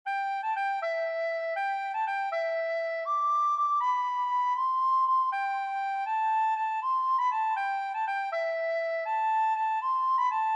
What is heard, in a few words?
Silent Night Recorder